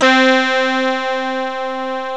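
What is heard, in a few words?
C3 distortion guitar; Drums; Proteus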